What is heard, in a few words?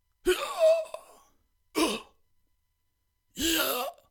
wounded